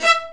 Spicato Basses & Celli

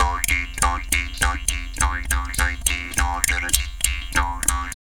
JAW GROOVES, Didgeridoo

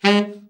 BRA TENOR SN, SAX; Best Service Gigapack 1; BR SAX 4

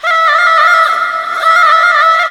REVERS FX, REVERSE FX, Funky Vocals; USB Soundscan vol.18; AKAI

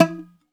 BOUZ PIZZ E 1

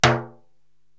Timbale Lo Rim, Korg TR-Rack

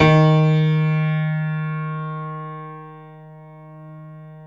PIANO D#3, STEINWAY; Greytsounds; Philip Wolfe David Crozier's Saxophones; GR8 STEINWAY

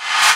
Reverse FX

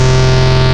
Resonant Chord, wavetables; Animoog

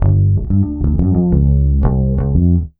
BASS, 180 BPM, Drum & Bass; Ueberschall; Drum & Bass Resonance

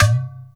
INDIAN DR, INDIAN SET; Spectrasonics; Heart of Asia

